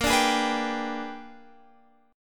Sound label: Bb 13th Flat 9th; Bb13b9